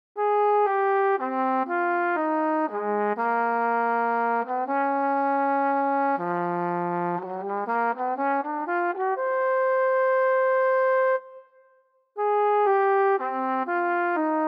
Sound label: flugelhorn; C Music Production